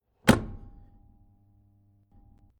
Industrial Large Electrical Mains Power Lever Switch On Metallic Impact